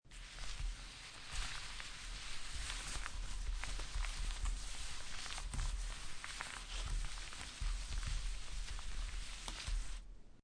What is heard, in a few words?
Playing Cards Shuffling By Sliding Cards Around On Table Long